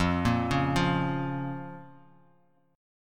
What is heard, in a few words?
F Suspended 4th Sharp 5th F; arpeggiated; 13,13,11,10; JGuitar